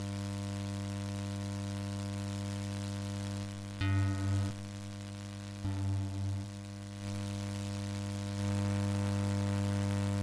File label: buzz mod duo